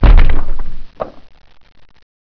door stop

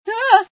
scream, female sci

